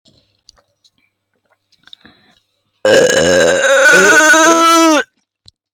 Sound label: earrape burp